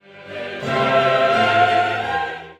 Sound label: Kyrie Ascend